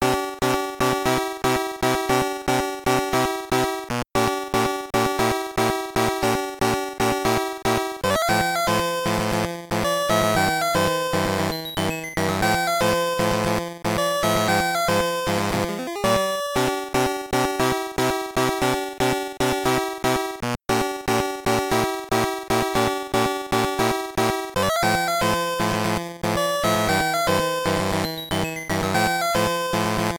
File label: All Clear